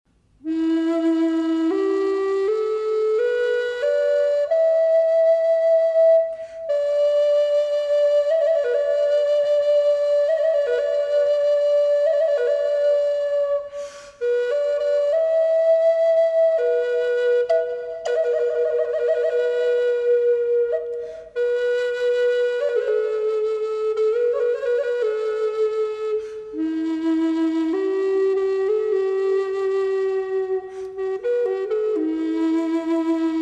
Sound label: Maple Burl Classic